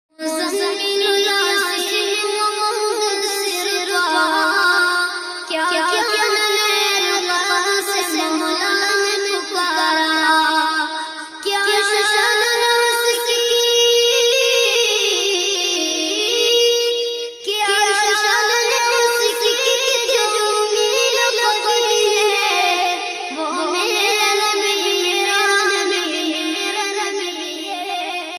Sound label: NAAT RINGTONE ISLAMIC